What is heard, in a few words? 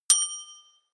notification bell